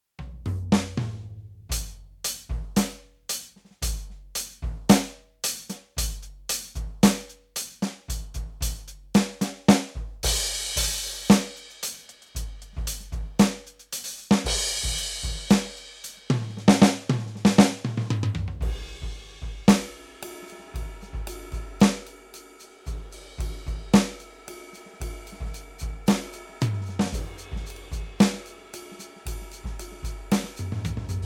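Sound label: Yamaha DTX10K Vintage Nashville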